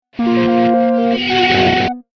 Scifi Audio Logo Ident, Glowing Lights Lost In Feedback; Scifi Audio Logo Ident 39,Glowing Lights,Lost in Feedback